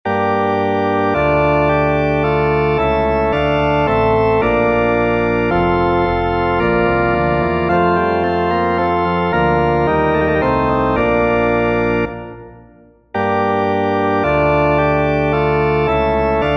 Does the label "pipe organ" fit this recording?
Yes